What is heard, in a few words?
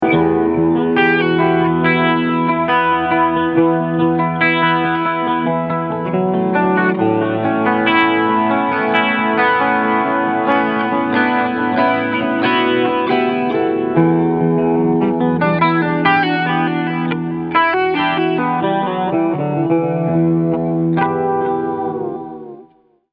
Morley AFX-1 Echo Chorus